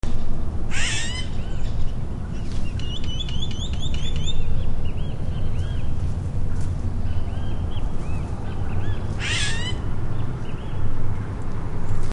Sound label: yellow-winged cacique